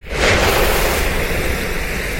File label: ignite trail